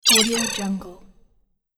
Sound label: scifi power loss; monochromeeffect